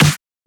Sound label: Snare; FLPRO